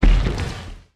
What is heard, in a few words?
asteroid collision oxide small; Factorio